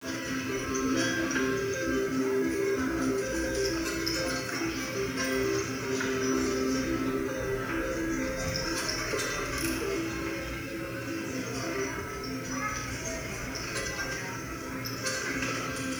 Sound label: Casino; fisk city